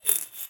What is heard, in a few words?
Shake In Glass, Medium Wine Glass